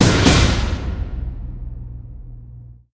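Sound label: PERCUSSION DOUBLE HIT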